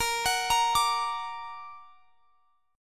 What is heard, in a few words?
Bb Augmented Bb, Chord; arpeggiated; x,x,20,23,23,22